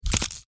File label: machine